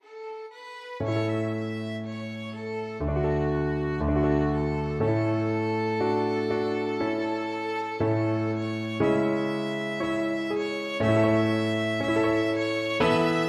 theres a man taking names violin

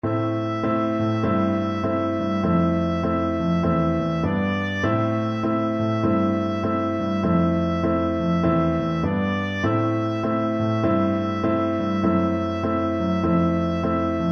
birds flyin high, oboe

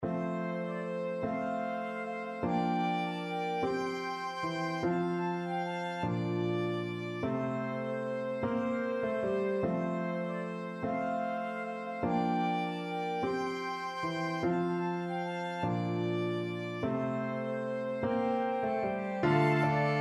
flexible ensemble and piano; 3 players and piano

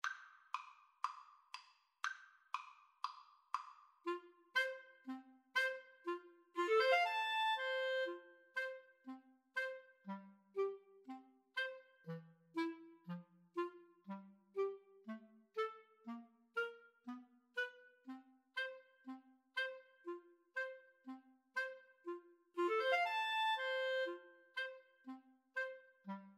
Clarinet Duet

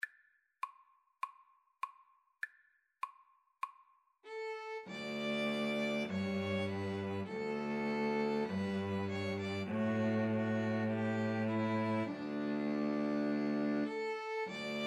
blue bells scotland, string trio

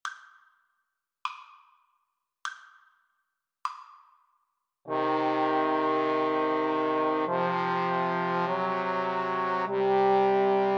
aequale, Trombone Trio

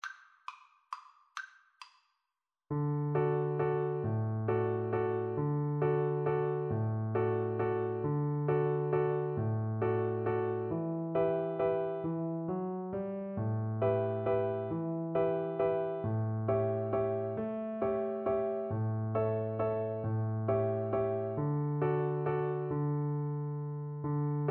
Viola

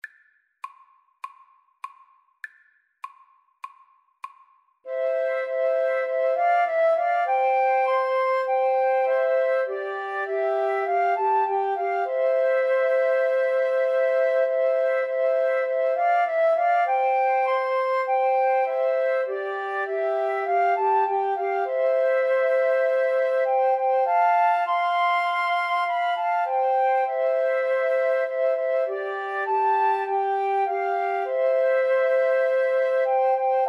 savior like a shepherd, Flute Trio

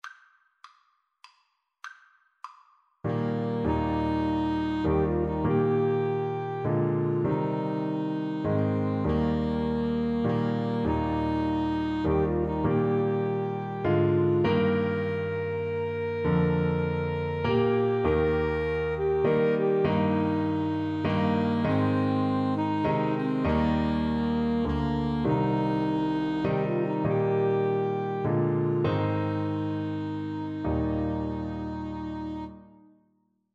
amazing grace 2 A SAX

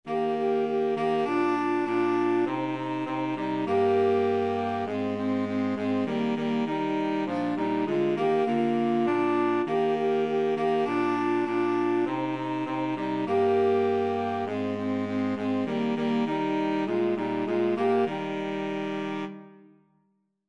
old st nic SAX T, woodwind trio

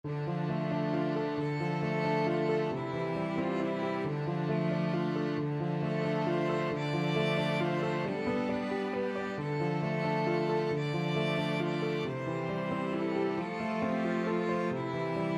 piano quintet; scarborough fair

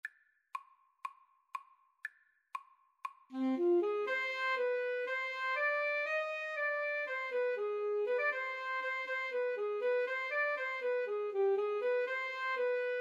Odessa Bulgarish 2 A SAX

